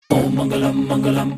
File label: Worshiping Sms Tone